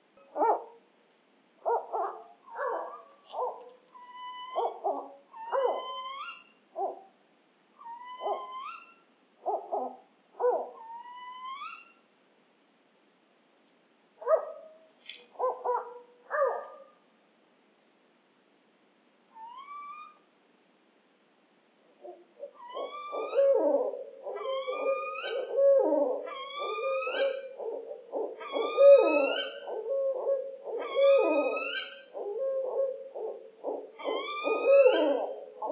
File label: Northern Spotted Owl and Barred Owl Interaction